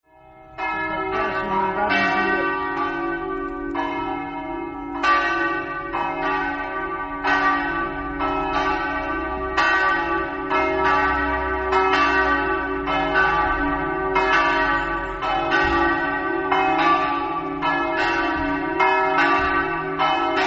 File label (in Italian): campane; terenzano